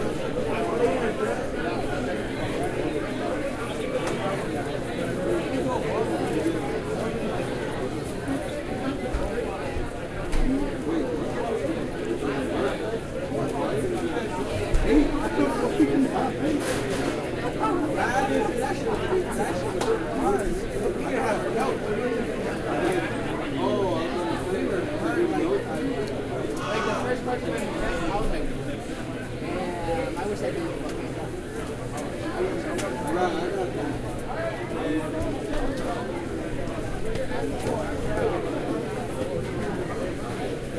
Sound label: school cafeteria; Golden Crayon Sounds